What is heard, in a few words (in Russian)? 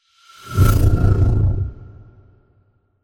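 рычащий звук; Короткие звуки для монтажа — для transition, движения, смены кадра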